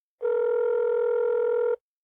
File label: telephone busy